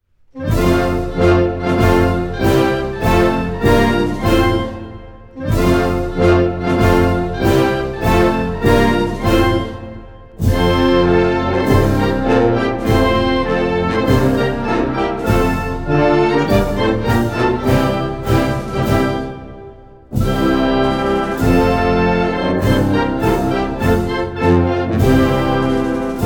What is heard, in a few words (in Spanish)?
Marcha real fusilera; guardiareal